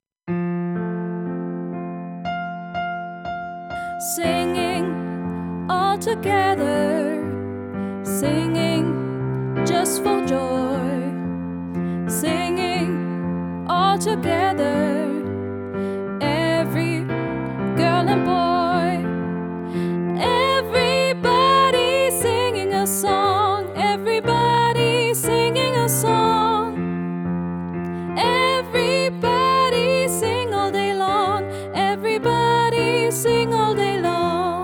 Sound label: Singing all Together